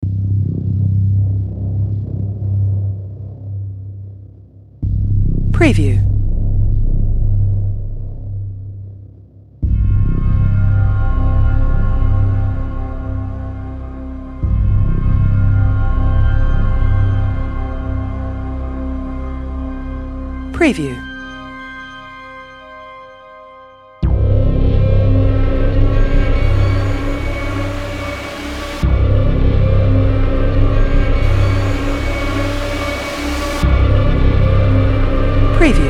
Cinematic Dark Horror Scary Fear Tension Thriller Terrifying Music